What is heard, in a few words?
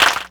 Clapbox Pitch; Ableton Foundation FreePacks